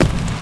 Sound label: fire spell